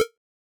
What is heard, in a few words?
warning